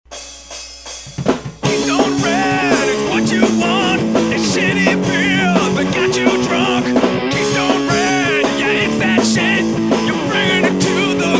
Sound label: rock